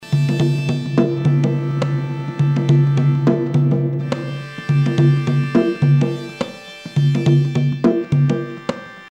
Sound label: Reverb